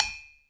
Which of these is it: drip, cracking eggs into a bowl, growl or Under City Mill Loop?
drip